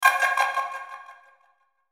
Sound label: Hit Burst Tonal Delay; Production Element Imaging Element Accent Transition